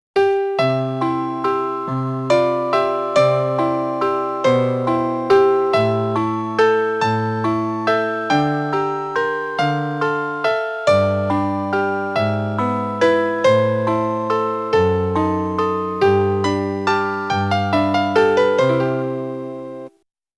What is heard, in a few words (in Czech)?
melodie